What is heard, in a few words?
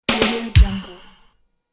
drums after joke badum tss